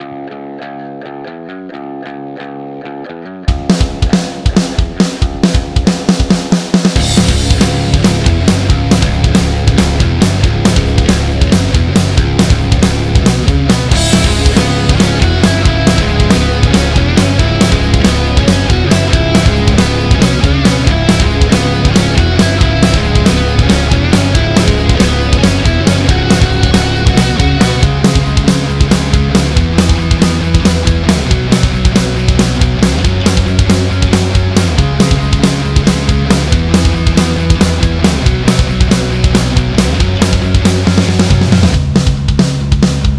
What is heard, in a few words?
BGM PVP